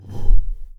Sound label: Whoosh Puff